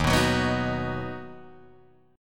Eb Minor Sharp 5th Guitar Open G

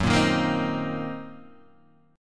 Fsharp 6th Flat 5th down Guitar DADGAD

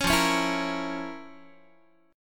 F Major 7th Suspended 4th Sharp 5th F, Guitar; strummed

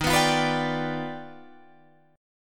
Eb Augmented Major 9th Eb down Guitar Drop D

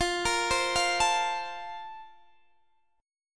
Bb Major 7th Suspended 2nd

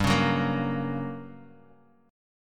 F sharp Major

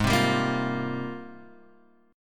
Ab Major 13th, AbM13 chord; strummed; JGuitar

